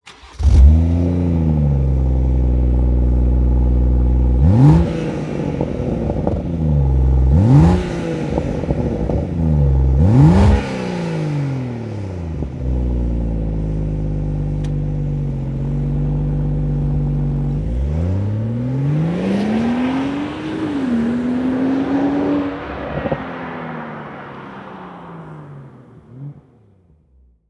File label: Stock exhaust BMW 440i